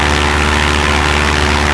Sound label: blimp; WhiteOut; XBOX; crater